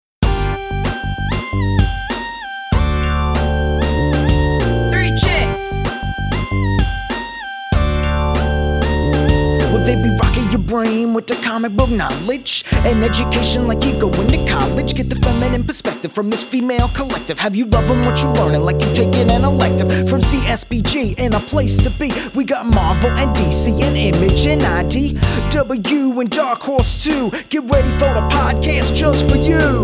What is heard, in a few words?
rap; 3 chicks